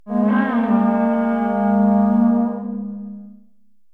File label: Boss Salmonid Incoming; Salmon Run SFX